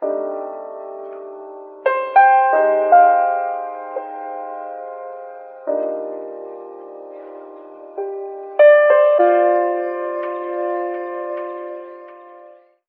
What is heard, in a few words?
piano, Loops Sad